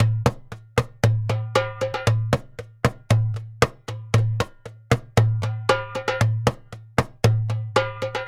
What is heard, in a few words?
Doumbek, Arabic; Sonic Foundry (Sony Creative Software); Continental Drift Aftershocks